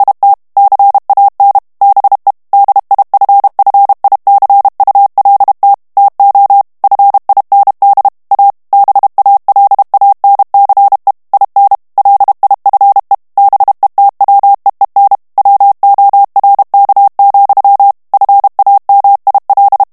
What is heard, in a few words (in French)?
ANGLAIS texte clair vitesse 30 mots minute, morse